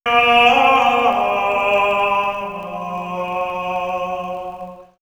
Monks Gregorian; vox mix; X-Static Goldmine

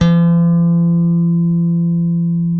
Bass, LATIN, F#4; Spectrasonics; Bass Legends; ROUND LATIN

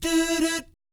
DOO DOOT, Vocal Planet Jazz; ILIO; Jazz & FX; JAZZCOMBO

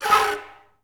FL FX, Advanced Orchestra

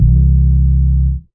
DM BAS, Dance Mega Synth

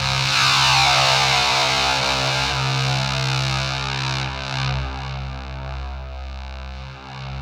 ZWEEP; Spectrasonic Distorted Reality 1

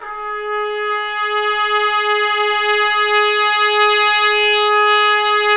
BRS TP SWE, Orch Ensembl; Roland L-CDX-03 Disk 2

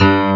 D. PIANO G0; AKAI S6000 CD-ROM - Volume 1

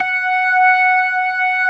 TARK, organ combos; Keith Emerson Signature Series

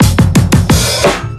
Loops

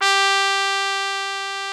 TRUMPET G3, MONO BRASS; Greytsounds VOL-1

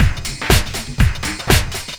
shufflin beat main loop; Sonic Foundry (Sony Creative Software); Ugly Remnants VOL-2

